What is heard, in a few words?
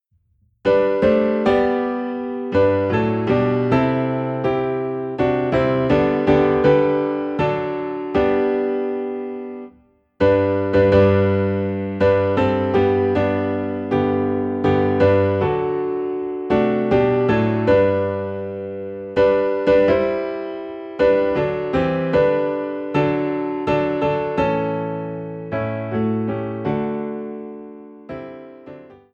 Piano in G; All the Way My Savior Lead Me